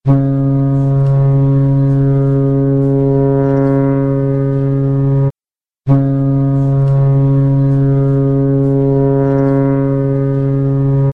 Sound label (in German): lang lang, schall